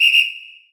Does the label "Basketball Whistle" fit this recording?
Yes